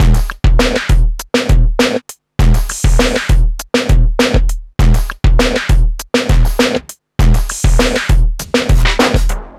distorter 100bpm

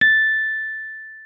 Rhodes MK1 A6; Xsample VOL-1

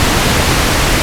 Pink Noise C2; Digital Sound Factory Xtreme Lead

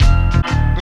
147 FRESH; USB Soundscan vol.20; Fresh Disco House I; AKAI; DISCO ADD

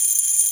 shells, drum machines

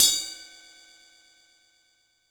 Ride Cymbal Cup, Standard Kit, Yamaha PSR-E323

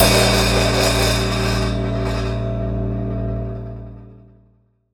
Cymbal; musicradar; cartoon caper

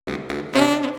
Reed; 90 sSampleCDs; Coldcut's Kleptomania VOL1; Brass n Wind

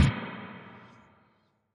Tails, Drum Bits, Verb Tails

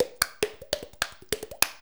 VOCDRUM, MOUTH PERC; Best Service; Hyper Dance

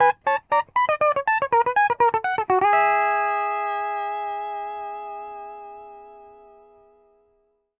PD LOOPS 120, Whole Lotta Country

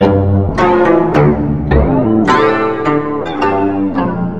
KOTO LP FX, Asian Traditions; USB Soundscan vol.60; AKAI; KOTO FXLP

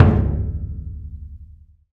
TAIKO; Roland LCDP14 Africa VOL-2; Afro Menu 1

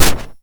Shotgun Snare, drum machine; 300 Drum Machines; DevSnd

